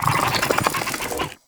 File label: infested fishing fish caught; Weapons; Infested Fishing Spear